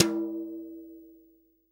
TOM, Real Drum Kit, SWING KIT

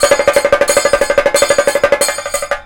KATAKA, Indian Traditions; USB Soundscan vol.45

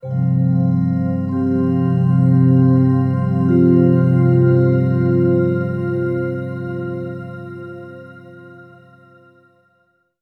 Vibe Bell Drift; Sonic Foundry (Sony Creative Software); James Johnson Spektral Minimalism; Sublime Keys

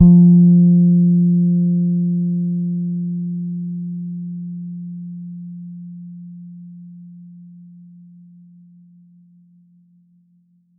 As F4 soft, Bass, Pedulla Thunderbass; Giga Samples Collection

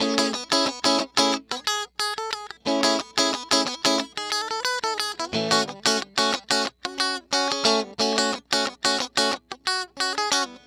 Electric Guitar; Sonic Foundry (Sony Creative Software); Mac Money; Guitars

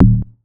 Syn Bass; Equipped Music

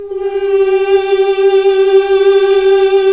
Nuns Mmmhh; Sound & Vision - Gigapack II

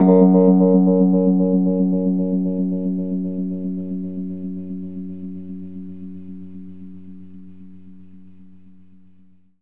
F#, Rhodes, Keys; 3D Sound; 3D Keys VOL-1

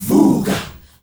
VOO GAHH R, GRP CHANT; Spectrasonics; Heart of Africa 1

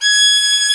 STR VLN, Str Quartet, Strings

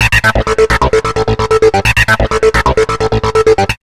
HRD 130 SYN, Big Beat; USB Soundscan vol.25; AKAI; HARD 130

